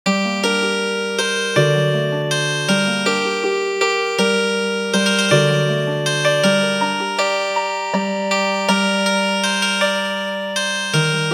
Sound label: Healin Harp; A little children